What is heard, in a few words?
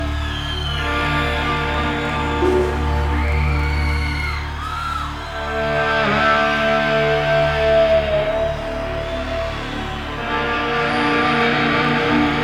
Guitar Solo, Live; Houston 1981